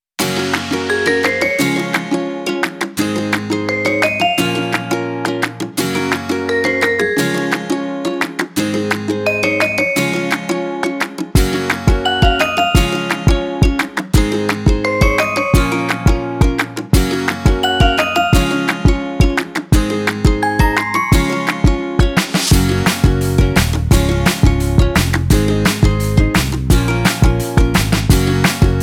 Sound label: Funny And Cute